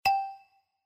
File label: panel sound